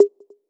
Percussive; Mutekki Media; Vengeance Electroshock Vol.2; VES2 Percussion

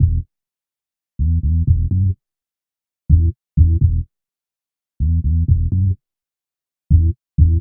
126 E G BASS, Funky Underground bass loops; Loopmasters; Funky Underground Vol 2

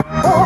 Eb STAB, funky; Loopmasters; Funky Underground Vol 2

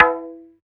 Perc, Dubstep One-Shot Drums

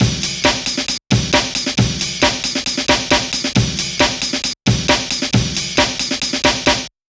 135 DRUMLOOP; Loopmasters; Booty Breaks Vol.2

